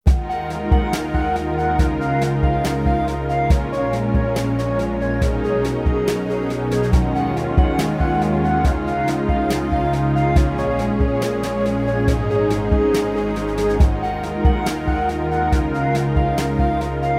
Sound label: xv88 crazy mix